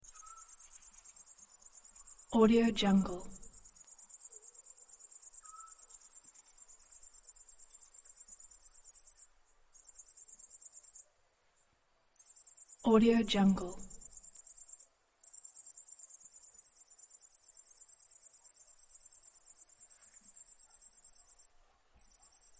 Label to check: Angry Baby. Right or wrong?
Wrong